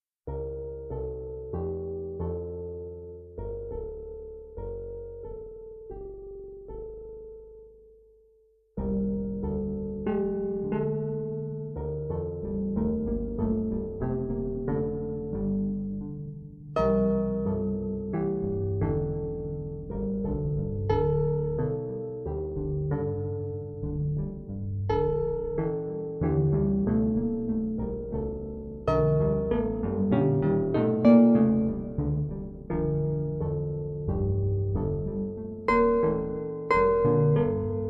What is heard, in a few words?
Arpa